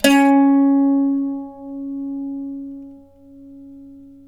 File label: c+3, Mandolin; Terkelsen